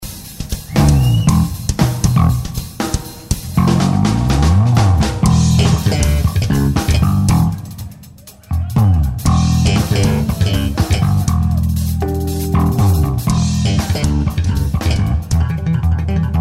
bajo elect

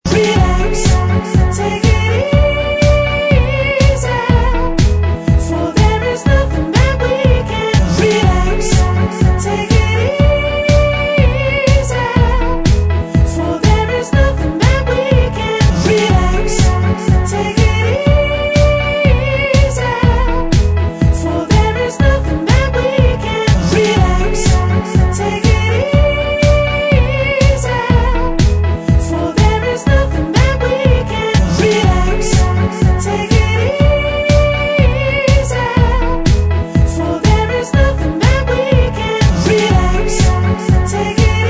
musical

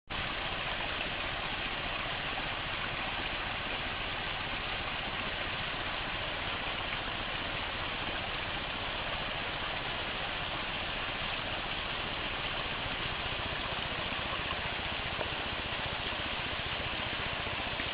Musica relajante de la naturaleza (riachuelo)

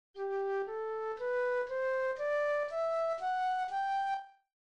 escala sol M

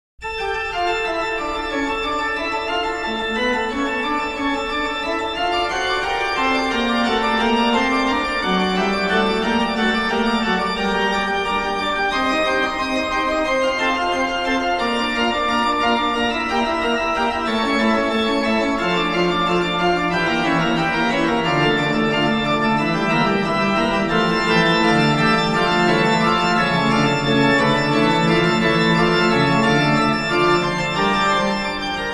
fuga

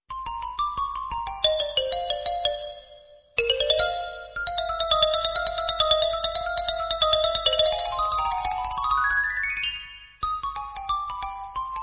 xilofono

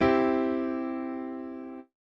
Perfecto Mayor

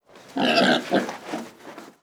Cerdo

